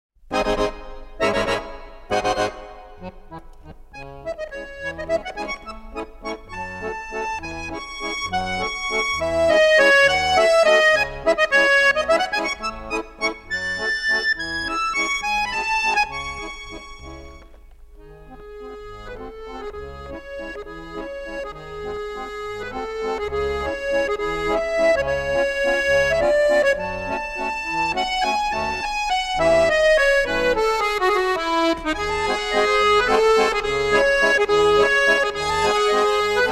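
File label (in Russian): zvuk BAYaNA, bayan accordion sound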